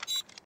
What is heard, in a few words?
reload, arms; EMXArms; pmg90